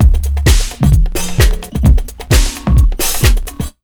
JUMP 130, Big Beat Drumloops; USB Soundscan vol.25; AKAI

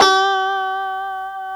G#4 FUNK POP, STRAT, Guitars; Spectrasonics; Hans Zimmer Guitars VOL-1

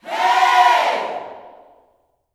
"HEY", Gospel, YELL SHOUT; ILIO; Vocal Planet

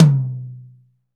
tom, Pop Country; Northstar; Drumscapes Roland

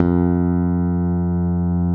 STICK, GUITAR FX

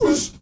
MALE WAR, Heart of Africa, shout

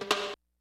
HITS BITS; Kid Nepro - Elite

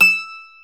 MANDOLIN

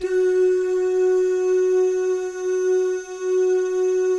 DOO, jazz FX, mix guy gal; Spectrasonics; Vocal Planet

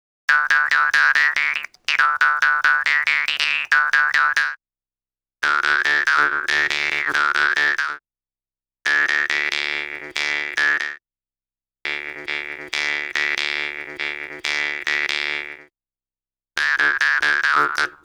Didgeridoo And Overtone; Good Stuff Audio

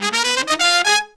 Pop & Funk Brass

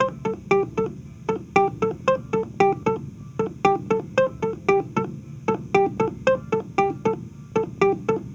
Cruisin' Disco Clavinet, 70s Analog Funk

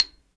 XYLO, Afro Xylophn; Roland LCDP11 Africa VOL-1; Bala & Xylo

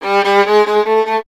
STR VLN, Solo Instruments; Miroslav Vitous

